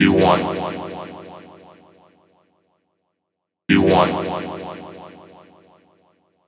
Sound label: Vocal Loop 130, Techno; Techno Sounds 2024; Vocals